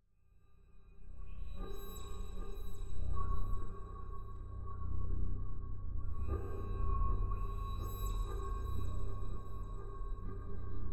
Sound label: Magnetic Field; 99Sounds; Magnetic Fields